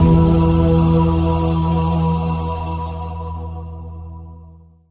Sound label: aohhhh, Voice; Notes